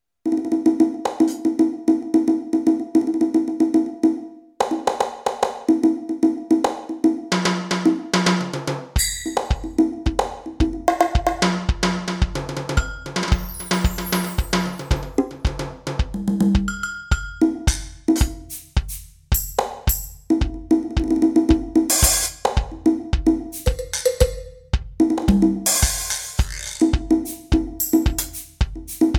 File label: Alesis Crimson II Kit Perc